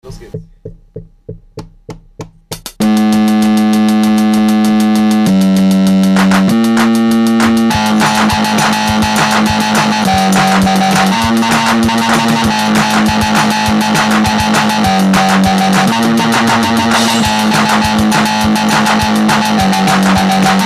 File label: my organ rocks below; Majestik12